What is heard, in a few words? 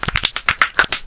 Doll attack